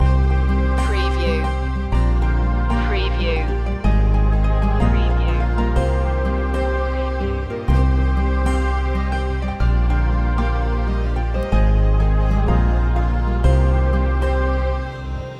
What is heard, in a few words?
sunny autumn loop